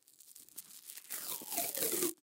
Lens Cloth Tear, Lens Cloth Tear Sheet From Package; Ripping; Foley; Sound Effects:Objects; SoundEffects-Blastwave